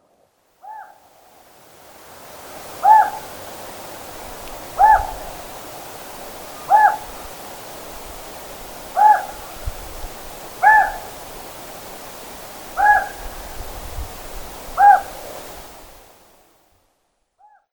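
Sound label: Japanese Scops Owl; tobishima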